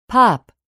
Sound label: pop